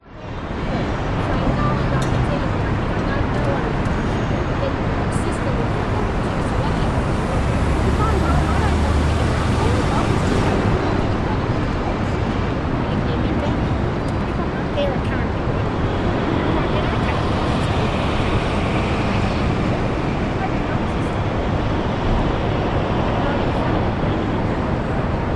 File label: brisbane cbd reddacliff place morning quiet people distant city hum